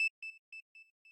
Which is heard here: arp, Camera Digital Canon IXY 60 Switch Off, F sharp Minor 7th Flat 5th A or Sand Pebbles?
arp